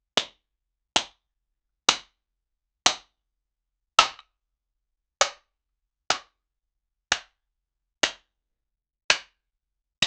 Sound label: man knocking out blind ma, disruption